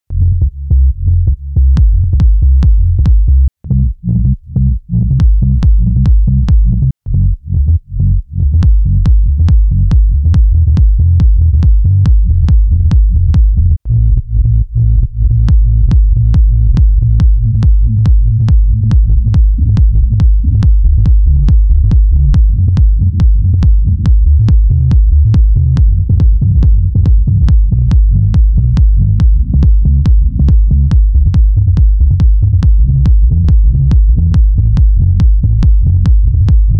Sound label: bassloop